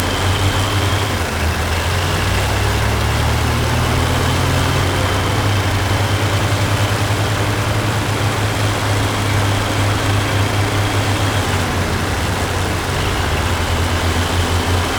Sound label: Tank Engine Moving Loop; Enemies; Movement; Lasrian Tank; Warframe